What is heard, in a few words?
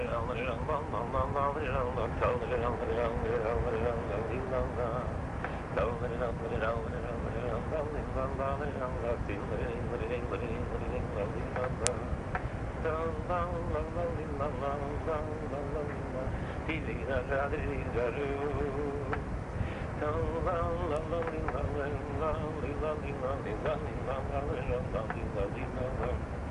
train niggun; modzitz